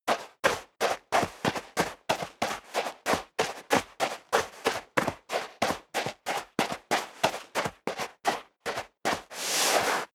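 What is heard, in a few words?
step dress boots sand walk sequence, footsteps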